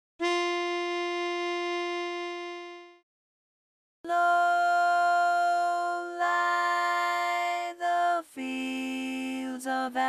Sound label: Tenor predominant; learning tracks